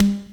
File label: high floor tom sample g sharp